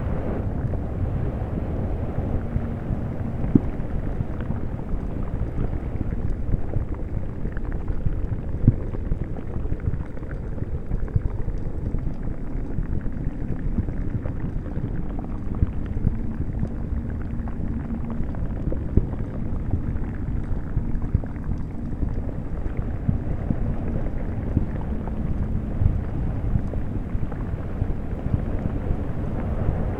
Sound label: Ambience Magma